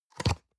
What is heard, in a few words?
box small open medium